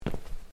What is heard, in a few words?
step; ADV2D; DemoGame